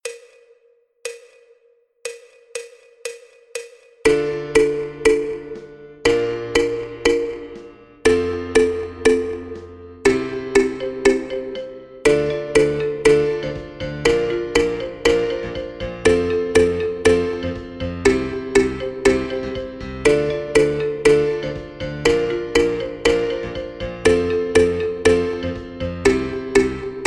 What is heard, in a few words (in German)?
Refrain schnell; Platteninstrumente